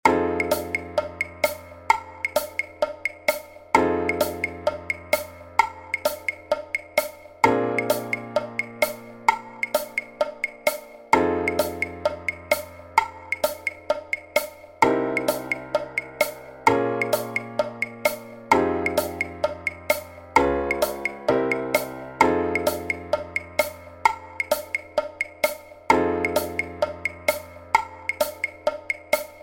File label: F Blues Riffs